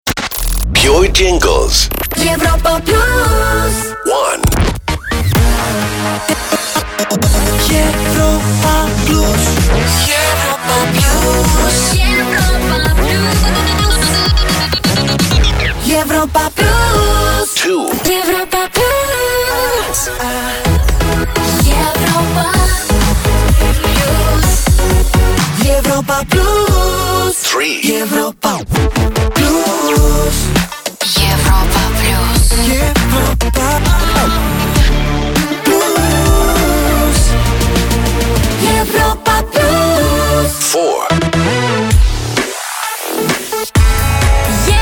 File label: Jingles; PURE; Europa Plus